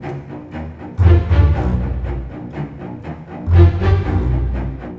GRO, Orchestral Loops; 128 PREDATOR

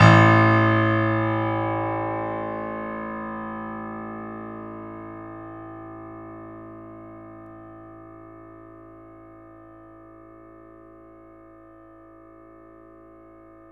3D Boesendorfer, Pianos, A; E-MU Producer Series Vol. 5 – 3-D Audio Collection

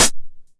909 HH Tape Sat; Goldbaby Tape808 & Tape909; The Tape 909

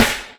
snare (hip hop); Sonic Foundry (Sony Creative Software); Ugly Remnants VOL-2; Beatstuff

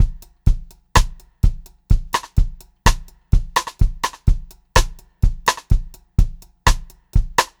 126 Pop-Rock Drumloops; 126 POP 4

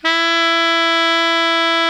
baritone mf E 3, saxophones; Greytsounds - Philip Wolfe David Crozier's Saxophones

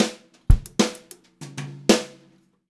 FILL, Brushed Drum Loops

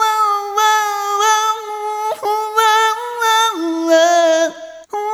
Rhythmic A

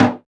Dofs Nur Sak, Percussion; Roland BK-5

